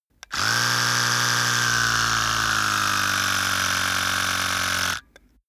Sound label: servo low buzz on off